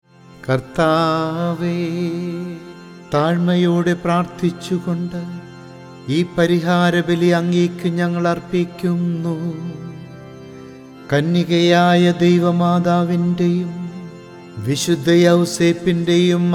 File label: Chant; Naivedya Prarthana; ordo cantus